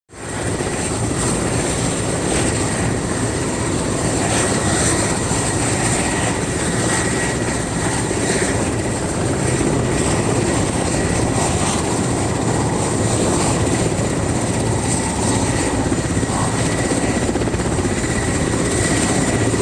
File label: Helicopter Hover